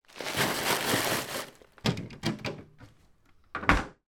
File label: food bag of frozen vegetables put into freezer and door close